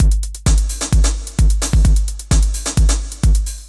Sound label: Control Kick Break, Jungle Breaks; Blu Mar Ten - Jungle Jungle - 1989 to 1999 Samplepack